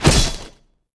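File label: impact sword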